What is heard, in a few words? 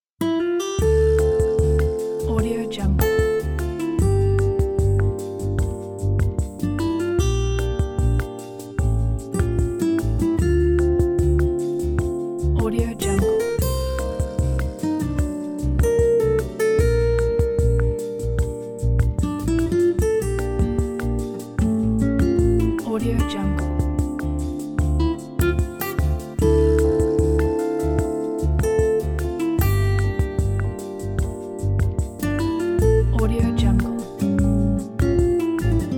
bossa nova